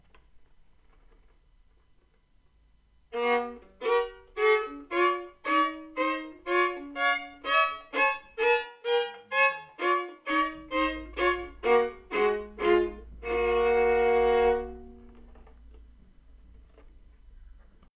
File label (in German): Violinvariationen